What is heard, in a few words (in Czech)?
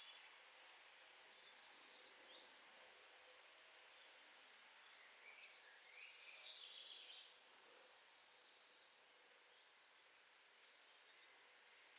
lejsek šedý Muscicapa striata